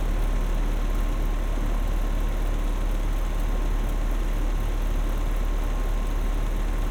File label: air conditioner outside; Golden Crayon Sounds